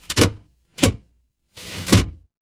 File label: mechanical lever